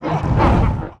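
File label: Ghost Attack; Monster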